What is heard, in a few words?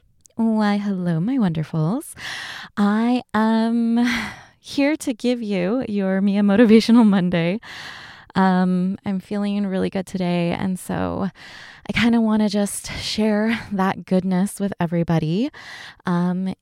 mmm